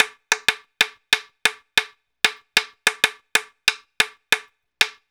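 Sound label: 93 bpm, Tongatown; Spectrasonics; Supreme Beats; African Contemporary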